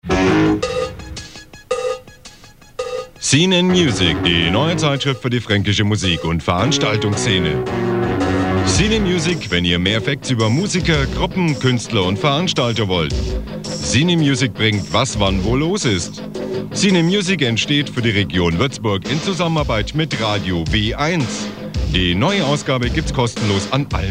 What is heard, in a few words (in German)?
Promo Szene Music